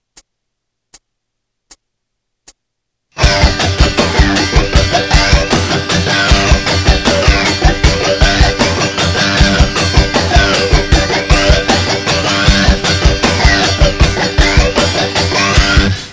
scratch, guitar

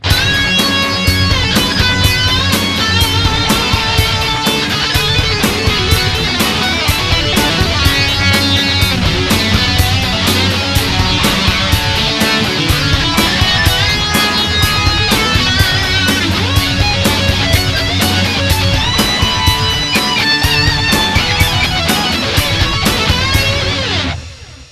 metal leadwork

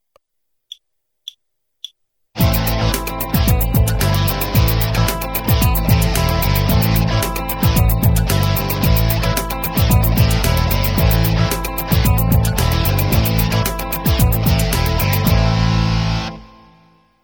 lick jam, guitar

